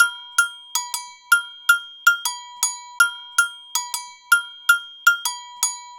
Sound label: Agogo Bells; Sonic Foundry (Sony Creative Software); Joe Vitale Latin Percussion